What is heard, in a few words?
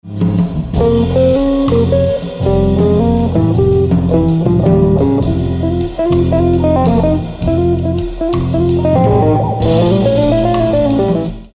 jazz; Jazzowe trio w Muzeum Dwór w Dołędze